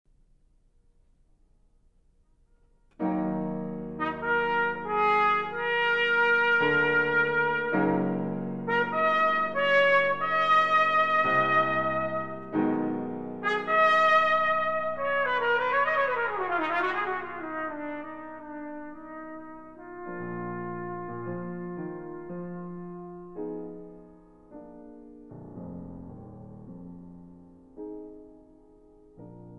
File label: Piano Tpt; Barat Fantasie